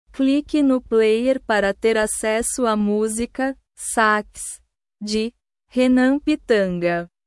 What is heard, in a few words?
sax